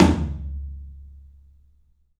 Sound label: TOM, 3-D Percussives; E-MU Producer Series Vol. 5 – 3-D Audio Collection; P.A. Kit